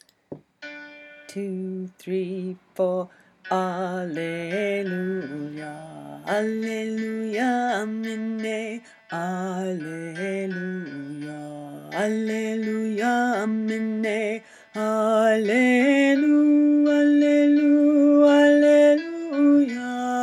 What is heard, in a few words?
Torres Strait Alleluia low